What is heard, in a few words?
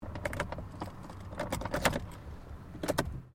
Car Ford Focus Key Ignition; Ford Focus hire car; Cars; Vehicles; SoundEffects-Foundation1